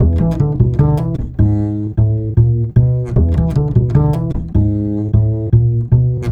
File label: Acoustic Bass